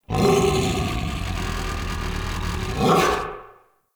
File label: Battle Dog Enemy Spotted; Animals; Vocals